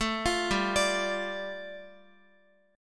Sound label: G 7th Suspended 2nd, Guitar; G7sus2 arpeggiated